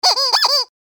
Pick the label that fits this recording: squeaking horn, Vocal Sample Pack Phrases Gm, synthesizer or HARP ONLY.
squeaking horn